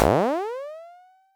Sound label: Sfx, Trance; Zero-G Trance Inducer; General Sfx